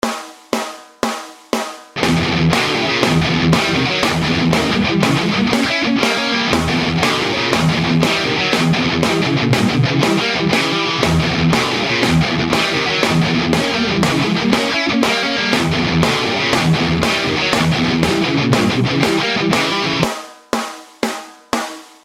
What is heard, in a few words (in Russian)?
Играем на гитаре